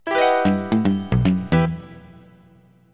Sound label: Collect Blueprint; donkey kong 64